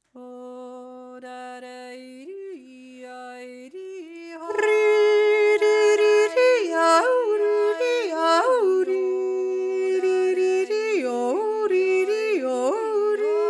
stimme jodler

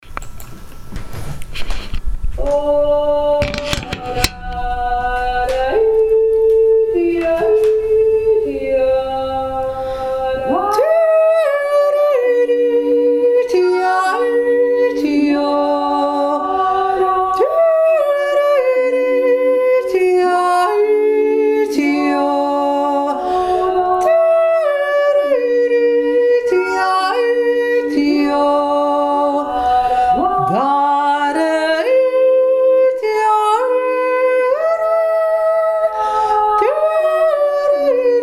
b uerinnenjodler

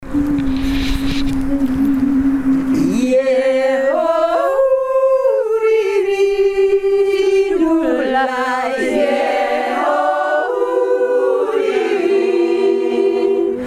wolfsjodler

